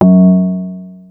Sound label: F#2, Synths; Electroflow; Ableton Live 12 Lite; Core Library